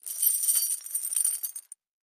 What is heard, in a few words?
Heavy Chain Movement